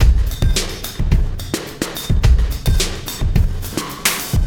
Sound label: Sky Lab Beat, Processed Drumkits